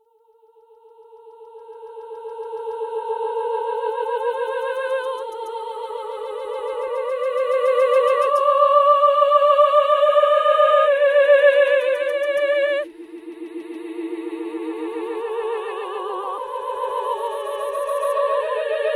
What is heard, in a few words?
Opera Delays